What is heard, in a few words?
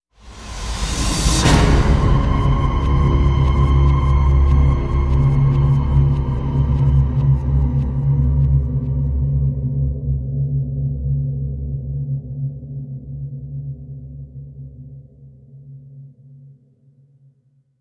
Hit Title Arrival (C); Brand X Music; Brand X Music Catalogue - Editor's Essentials